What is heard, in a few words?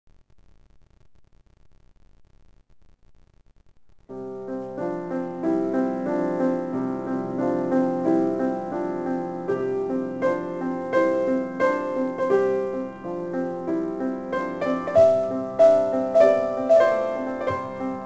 piano